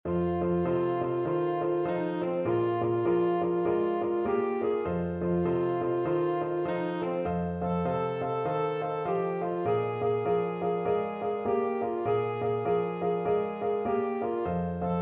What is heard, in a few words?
style tenor saxophone